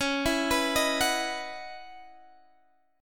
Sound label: Db Minor 11th Db